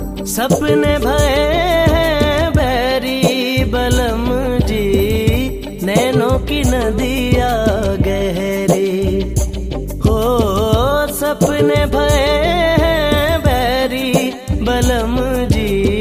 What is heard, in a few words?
New Sad Song Ringtone